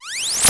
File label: open